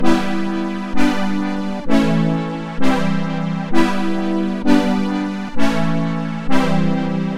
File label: synth chord